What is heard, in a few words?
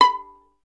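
STR violin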